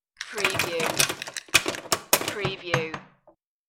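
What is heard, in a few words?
Ice Cube Sink Dump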